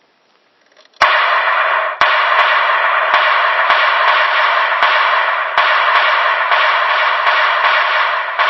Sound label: machinegun